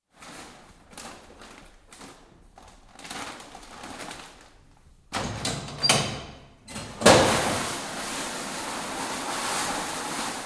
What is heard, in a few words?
Animal Feed Mill, interior, feed bag filled & sewn up; Live acoustic recording; Machinery; BBC